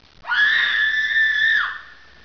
f scream; jizda smrti

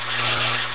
zap; duke nukem total meltdown

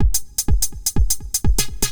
XTRA HOUSE 125

